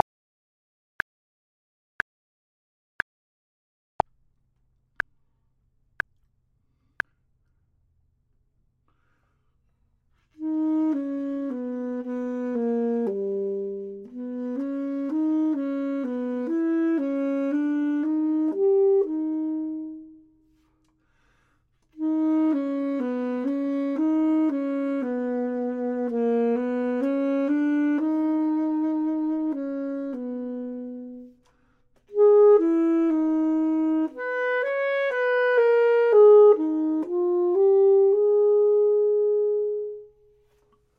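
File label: bottom, Saxophone and Clarinet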